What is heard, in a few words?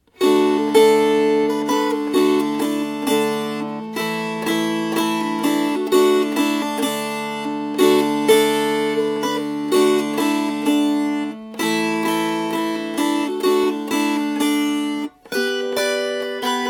Strummed